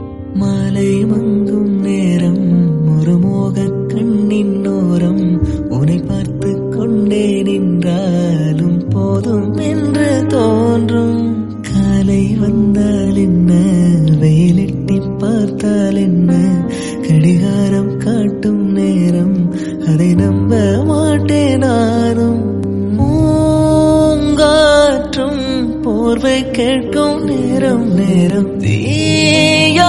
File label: tamil sad